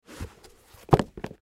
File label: Shoes Take Off; Shoe Sound Effects; Objects; Foley